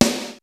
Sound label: XR-10 K+SN+H, drums